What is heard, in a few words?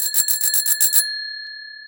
bike bell, street traffic; best service Blue Box